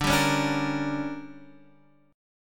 D Major 7th Suspended 4th Sharp 5th, Guitar Open D